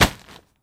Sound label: Bag 50 Pounds Throw G, bag drop